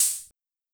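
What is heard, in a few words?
Hi-Hat Open, Luxor Passet; Hammond Rhythm; Drums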